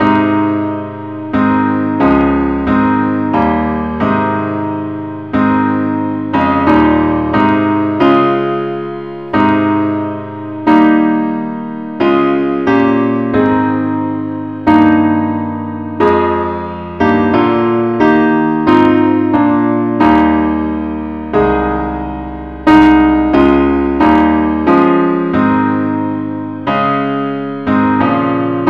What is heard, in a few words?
parts only key aes; christe Sanctorum